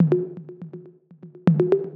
122 BPM Toms, drum; mmb drum arsenal vol 1

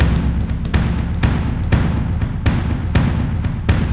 122 BPM Taikos; mmb studio melodic

